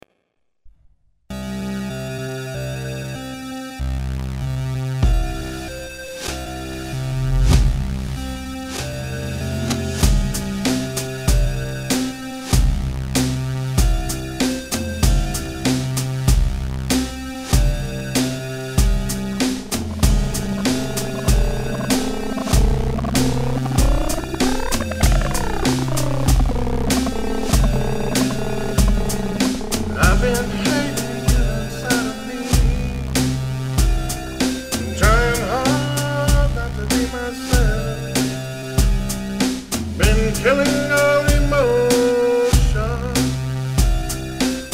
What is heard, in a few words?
Incomplete Thunderstorm